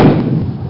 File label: bang; roadhog